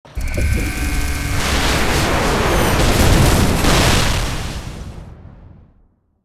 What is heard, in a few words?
Boss Break Counter